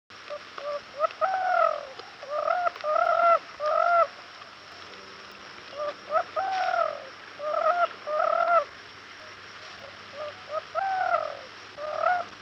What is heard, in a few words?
Spotted Dove, bird call